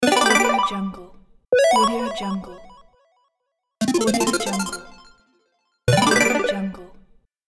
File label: 8bit points pack